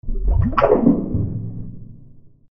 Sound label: liquid event